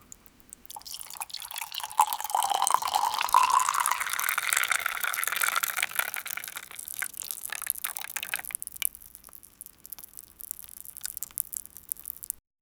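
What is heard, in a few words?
blood splash or pouring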